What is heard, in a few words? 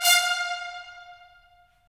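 brass trumpets staccato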